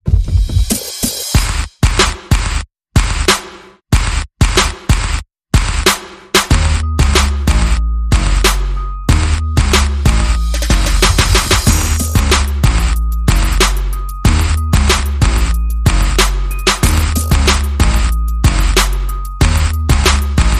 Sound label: No Vocal